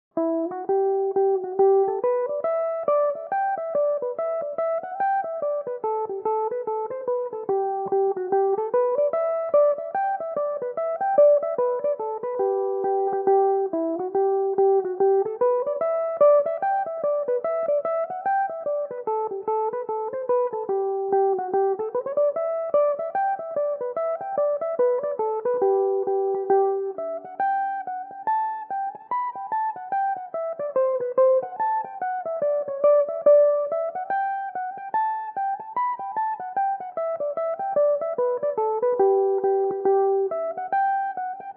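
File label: hornpipe g major, mandolin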